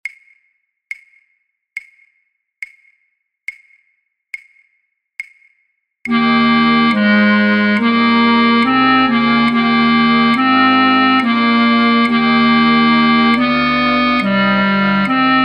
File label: flute choir, Altfluit 2A, Altfluit 2B; voor oefentracks; Matthaus